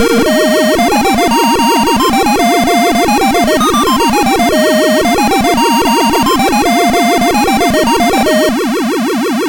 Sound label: Musicbox, sid MOS6581R4